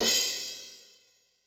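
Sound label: Deep Dark, Cymbal; Yamaha DTXpress-II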